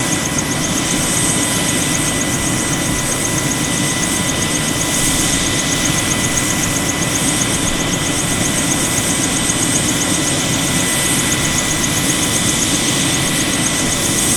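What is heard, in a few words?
Heli Idle Loop, bell 212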